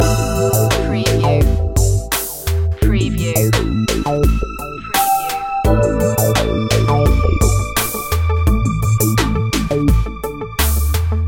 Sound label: soft plug in loop